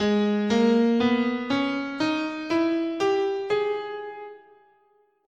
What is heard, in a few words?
Harmonic Minor, scale, guitar; Gsharp